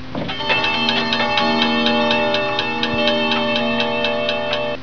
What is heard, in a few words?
CLOCK